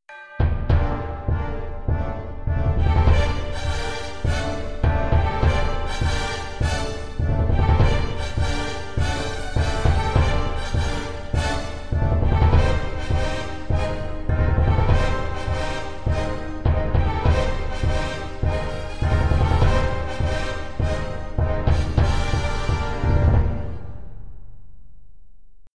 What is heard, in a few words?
Battle, Banjo & Fiddle; documentaries